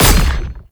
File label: Projectile Impact; Ultimate Abilities; Madurai; Warframe; Focus